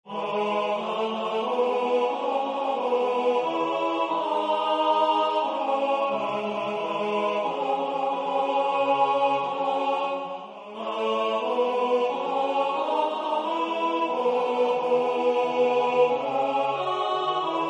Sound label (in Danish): tenor; Danmark, nu blunder den lyse nat